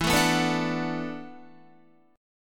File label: E 7th Suspended 2nd Suspended 4th, down, Guitar; E7sus2sus4 strummed; JGuitar